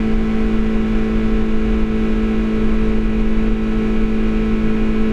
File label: Mazda Miata MX5 NBFL 1.6 Modded - INT - Cabin - 7k RPM loop; stereo; Soundholder